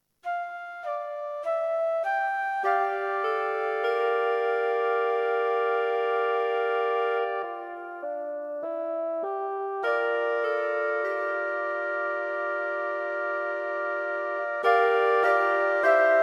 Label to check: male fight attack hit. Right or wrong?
Wrong